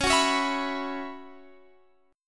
F sharp 7th Suspended 4th, guitar

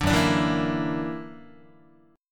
F sharp Augmented 7th, down, Guitar; strummed; 4A,4E,5A,5D,5G#,6C#; 5,2,5,2,2,3; JGuitar

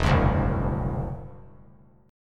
E 7th Suspended 2nd Sharp 5th, E, down; E7sus2#5; strummed

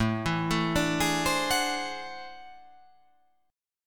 A Minor Major 13th, down

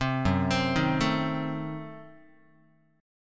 F sharp Diminished 7th F sharp Guitar; arpeggiated; JGuitar

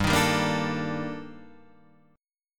D sharp Major Sharp 11th, G

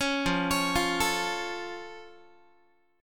F Augmented F Guitar; F+ arpeggiated; 16,13,16,15,13,x

